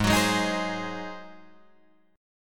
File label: G sharp 7th G sharp, Guitar Drop D